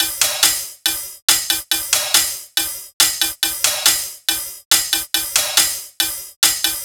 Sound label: Cymbals 140BPM, dubstep; Loops; Drums; GhostHack; Dubstep Sounds 2025